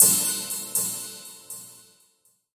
Cymbal Hit

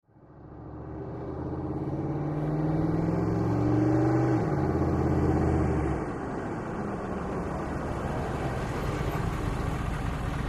Humvee: Fast In, Idle And Away.

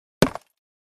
Clay Chimney Wall Hit, concrete & brick

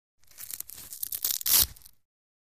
Biking Velcro Pull

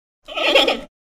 Panda Bleat Single

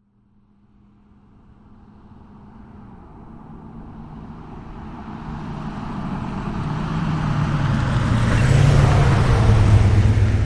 Wagoneer: Slow By With Decel, Jeep Wagoneer

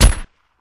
Gun Shot Silencer, silenced singles

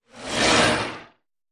Animal Stinger; stingers & whooshes

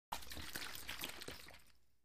Water Bottle Shakes

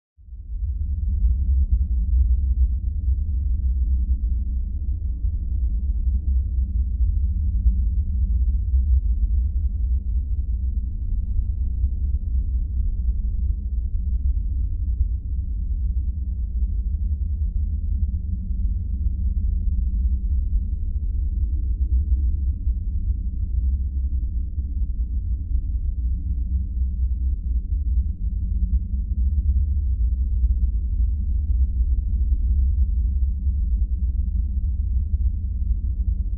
Ambience Rumble Vol

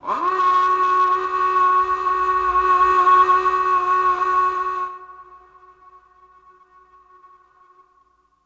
Klaxon Lng Fade Out, boat horns

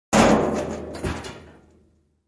Garbage Can Set Down, garbage truck

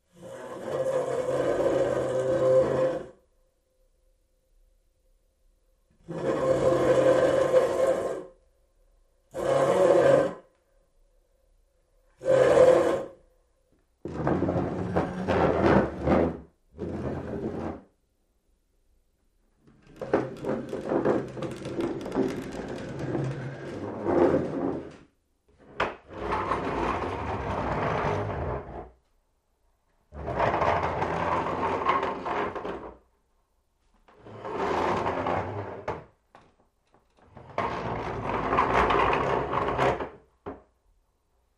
Chair Piano Bench Scr, seats & stools

